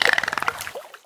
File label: squak; World Of Goo; Fish